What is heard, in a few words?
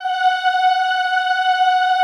EHH F#4, Classical Choir; Best Service; Extended Classical Choir; EHH MIX